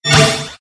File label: Pick Up Repair; Burnin' Rubber 5 HD